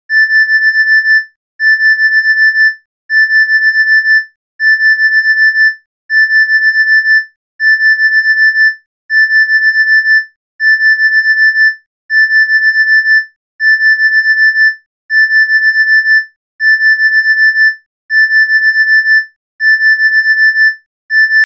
Sirens And Alarms

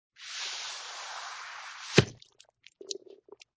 Wet Squoosh Pop

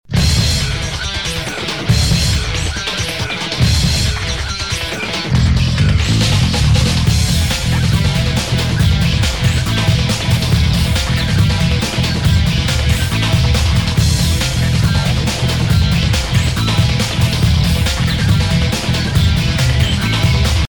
Loud Music For Children

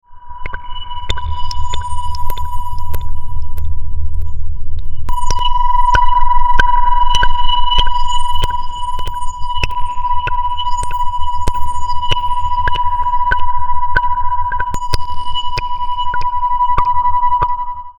Sound And Water Drops

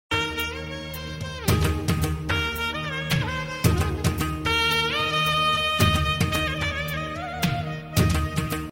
Sms Tone

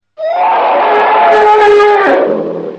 Elephant Sounds

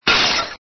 Glass Breaking

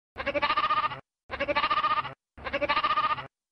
Sheep Baa

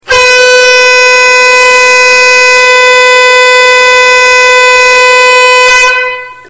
Loud Train Alert

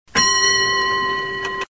Bellbee Bell

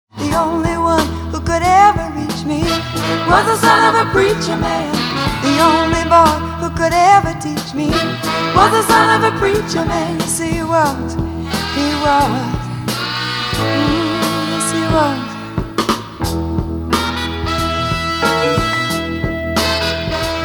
Beautiful Female Voice Singing

